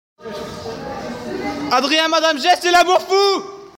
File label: vocal